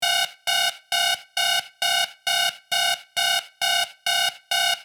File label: Futuristic alarm imminent threat or warning loopable